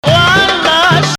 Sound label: Nahawand